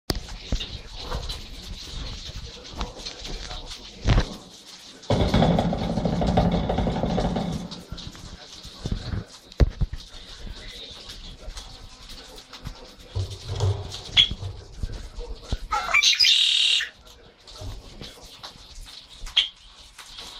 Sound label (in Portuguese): Canto do grauna de ombros morenos